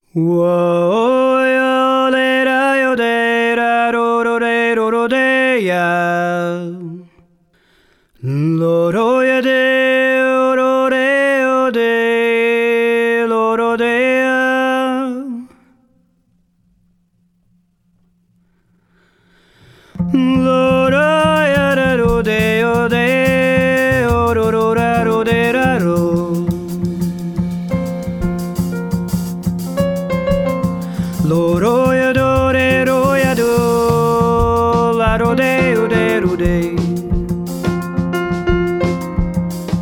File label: Interlude